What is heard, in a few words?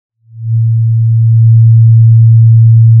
Sound of a cruise ship horn